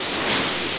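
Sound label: MOVE NOISE, anime sound fx, comic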